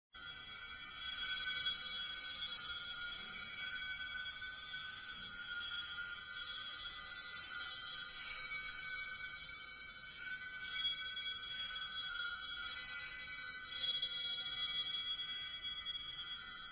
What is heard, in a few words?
ambience; fear